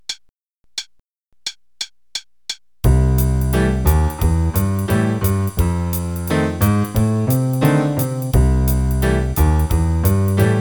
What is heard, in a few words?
bass and drums, qn=176; Level 1 Performance - page 24